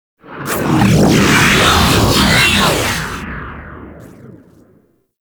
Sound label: Fighter Ship Close Fly By, Space Fighter Ship Engine; Warframe; RailJack; Enemy Ships; Corpus